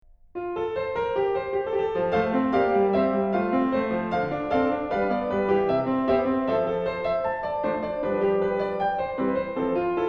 Prelude in F, Piano; Bach; BWV 928

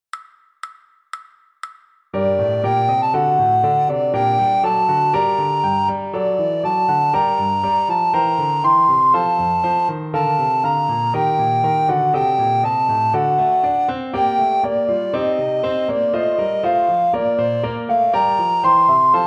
Presto, recorder, fast; Handel

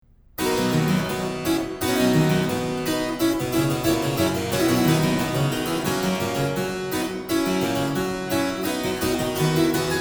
Harpsichord; Bach; Prelude in C; BWV 933

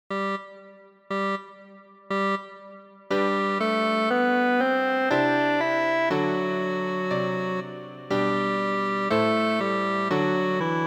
Largo trombone

